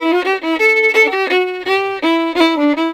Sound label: VIOLIN, Celtic; USB Soundscan vol.42; Celtic Flavours; AKAI